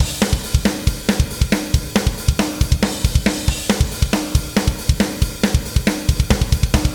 Drums and Jingles Battle Drums

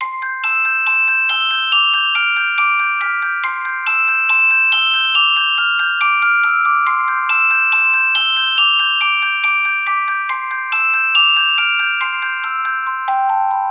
Stuffed Animals, reveal music; Spanish

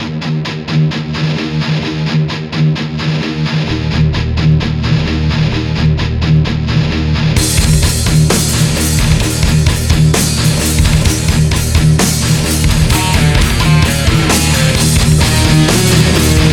Heavy Riff Looped; Dual Blade Battle of the Female Ninja

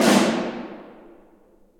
Snare

